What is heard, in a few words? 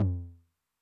Med Tune Med Decay, 909 LOW TOM; classic machines; LT - LOW TOM